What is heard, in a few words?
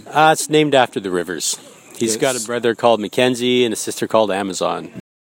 dog